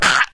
odd, infected die; Left 4 Dead 2; Source Engine

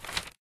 trade up envelope fold

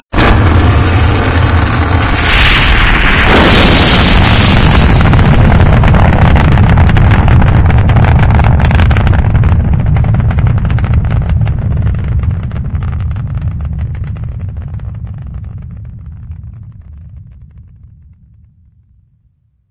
rocket takeoff blast; ambient; launch; source engine; ep2

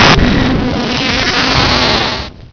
beam start; Source Engine; bshift

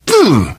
hurt minor, biker; voice

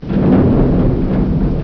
door move; Source Engine; bshift

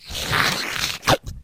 cow lick lips slurp

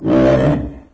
hunter kick; video games; source engine; ep2; outland

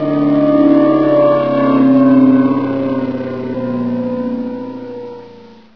teleporter off; Source Engine; bshift

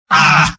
negative vocalization; spy; video games; source engine; tf; mvm

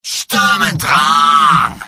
cheers; medic; video games; source engine; tf; mvm

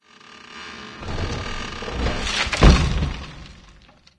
wood debris; ambient; Left 4 Dead 2; Source Engine; random amb sfx

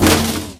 sentry upgrading, weapons; tf

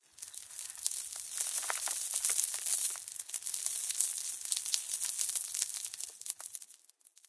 random debris; ambient; Left 4 Dead 2; Source Engine

